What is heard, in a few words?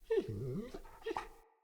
Pack Wolf Idle Grunt; Animals